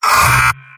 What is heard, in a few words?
medic pain sharp, mvm; tf2; garrysmod